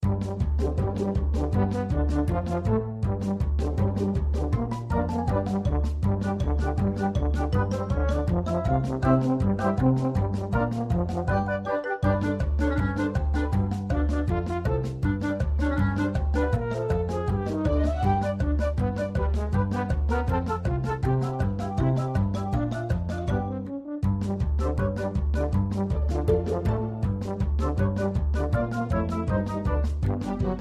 menu loop

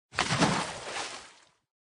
fall

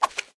flip card

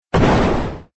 explode Effect

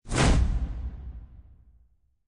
fail

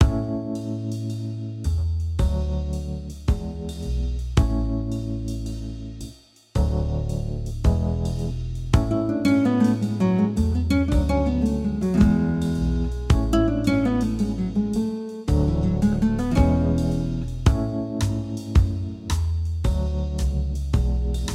music

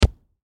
ball

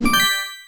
match

